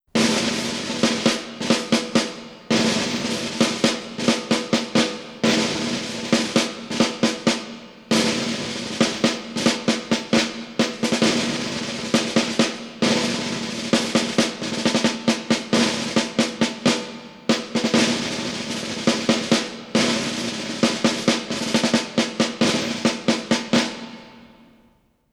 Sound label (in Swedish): Generalsmarsch trumma